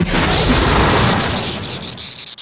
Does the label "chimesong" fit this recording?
No